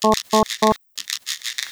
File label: Drug delivery, alarms; J Edworthy 2019